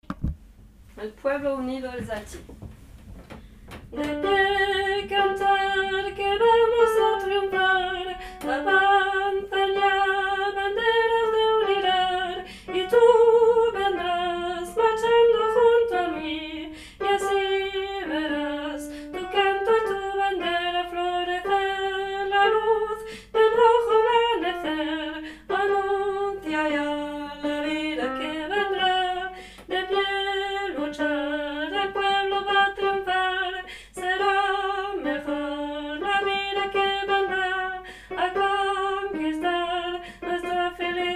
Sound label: alti